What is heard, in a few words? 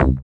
Dance Bass; Sound & Vision - Gigapack II CD 1